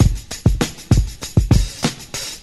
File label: Drum loops, Funky